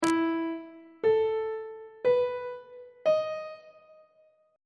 d double sharp major 7th suspended 4th chord intervals; on treble clef